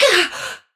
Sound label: Pain; Warframe; Tau; Dax; Ninja